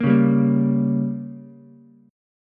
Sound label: Bb Minor 6th, Ukulele; strummed; JGuitar